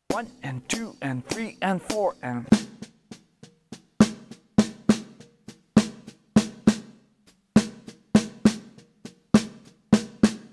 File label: Melody Straight 8ths